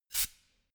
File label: pneumatic pump short burst soft